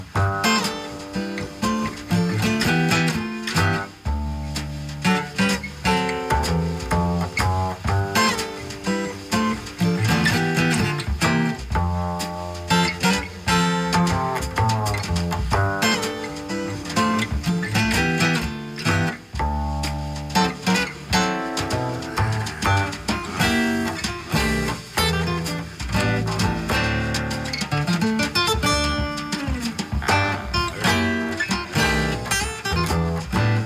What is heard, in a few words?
Heathkit W-6M WA-P2 FM-3 OTA Audio Sample